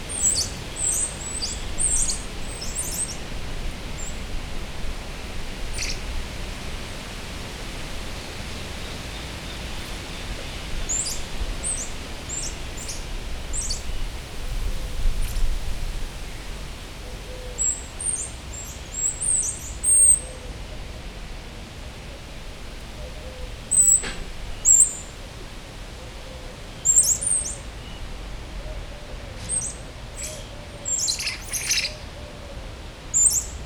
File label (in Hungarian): itt jól hallható, közönséges mókusmajom; Győri Zoo 2014